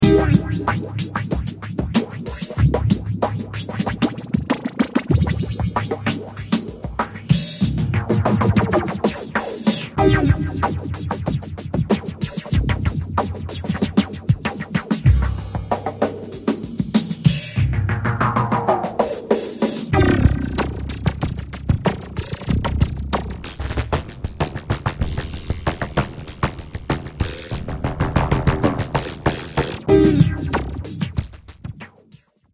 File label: drum